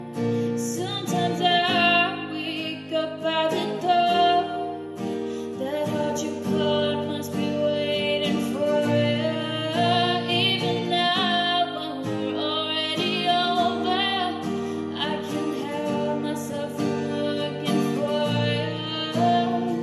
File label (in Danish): akustisk cover